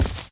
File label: run tile, footsteps